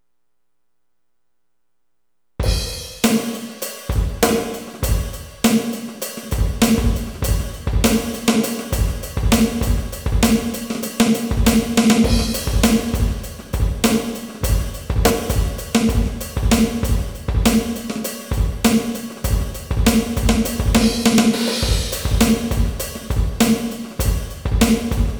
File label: heavybeat 100